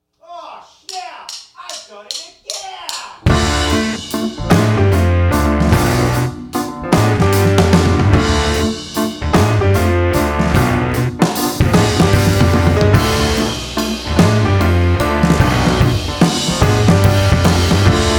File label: Instrumentals, cinematic instrumental